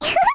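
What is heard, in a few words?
Vox Laugh, Korean; heroes; Lilia; kings raid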